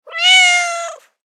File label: cat sound effect